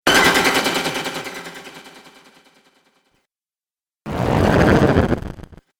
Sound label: Dubstep Scifi Effects